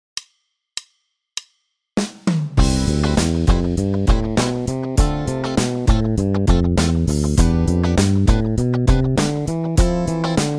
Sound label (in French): tous les modes; exercices